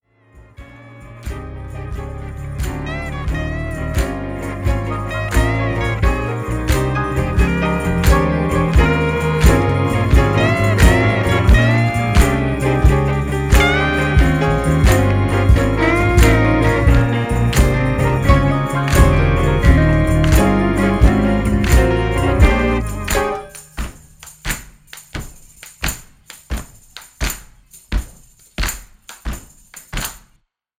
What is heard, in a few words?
instrumental